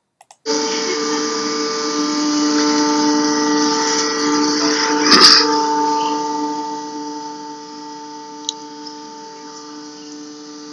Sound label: Dishwasher Whirlpool Buzz; Grand Marais MN; noise diagnosis